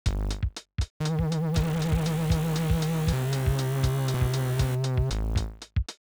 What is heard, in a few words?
Squaretones